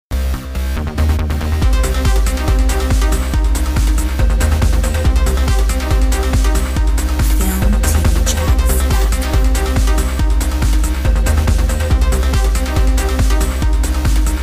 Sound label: Techno trip